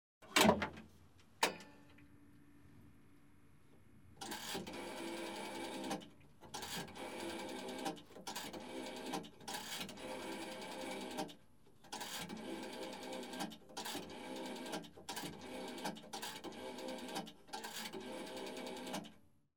Payphone call dialed (9 digits); Communications; The BBC Sound Effects Library

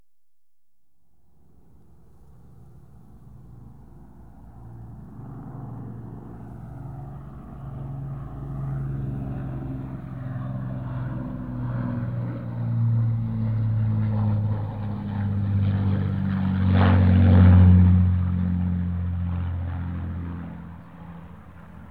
PASS, Aircraft; The BBC Sound Effects Library

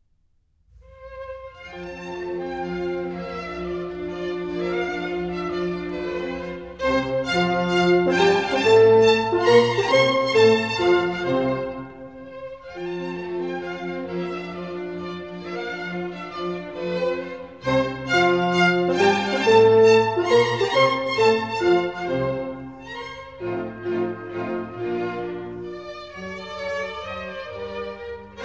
Minuets, F Major; Mozart; Dances and Marches

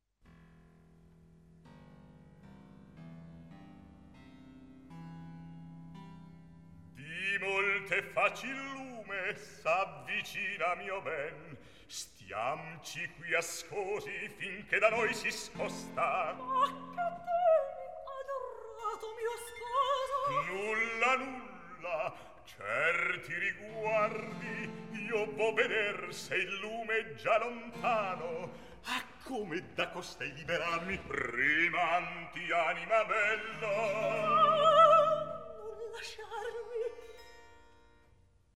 Recitativo; Di molte faci il lume; Mozart, Wolfang Amadeus; Opéra - Don Giovanni

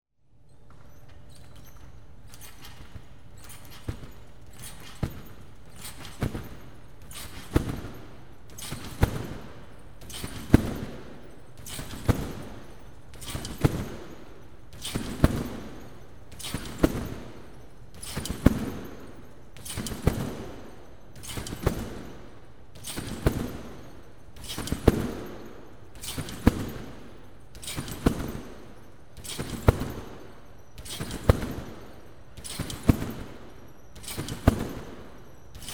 Trampoline - Using a competition mat; The BBC Sound Effects Library; Sport and Leisure

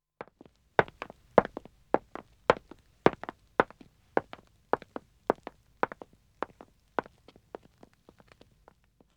Wood (Dead Acc) Walking 2 men, Footsteps; The BBC Sound Effects Library

